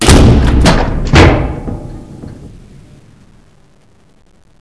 door stop

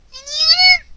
strike; shii

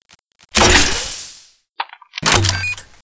pulse rifle reload

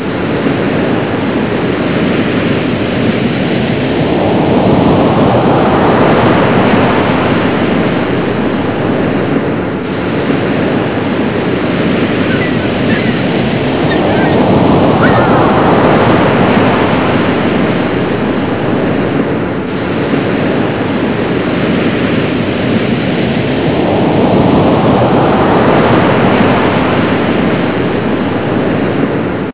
sc5x harbour

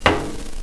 player ladder

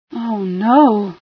startle, female

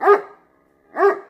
pseudodog idle

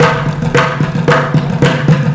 drums; sven

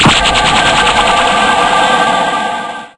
ice launch

